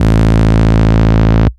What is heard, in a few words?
Bass Root A